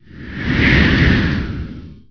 wind gust

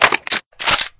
dart open, weapons

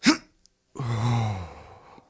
Morphine Injection; police

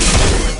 razor fire; ut99

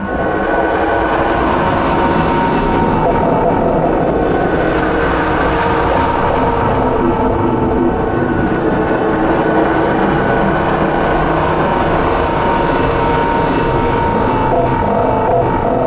wild space ambience right

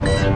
button

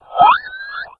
alert, houndeye

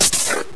pistol reload, weapons

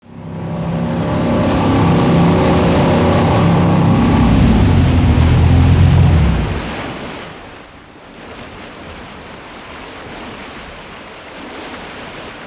rib dock

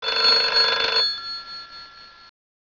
tele ring

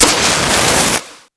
flare ignite

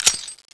clipout

